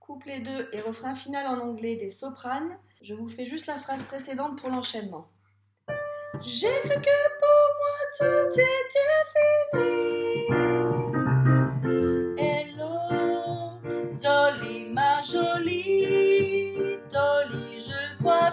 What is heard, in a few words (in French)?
soprani couplet en anglais; pupitre soprani; enregistrements de travail